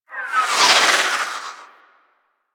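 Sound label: Super Weapon Missile Projectile By; Warframe; Vehicles; RailJack; Proc Ships; Corpus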